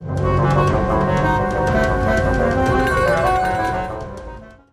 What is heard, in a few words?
Gnome Villain Play Stinger